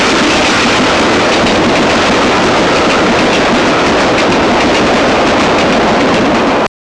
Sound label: PASSING, TRANSPO; Easy Personal Web Page